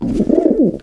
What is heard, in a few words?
pigeon coo; gesource; DuClare Chateau